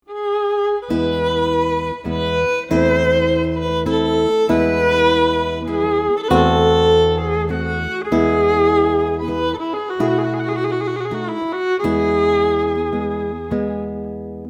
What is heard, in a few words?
Sicilienne, Duo of, guitar violin; von Paradis